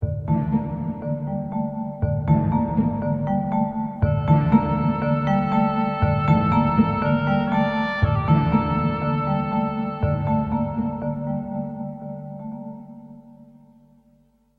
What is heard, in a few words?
Music; TR4; FMV